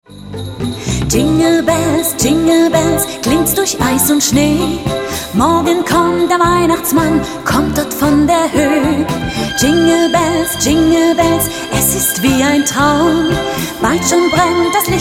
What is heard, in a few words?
jingle bells